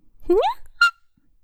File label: boop